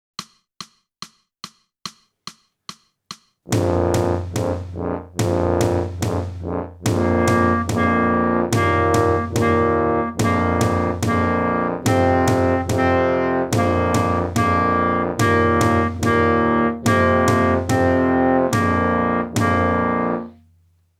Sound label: clarinet; boosey method